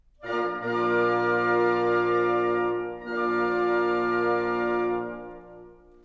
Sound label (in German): Akkord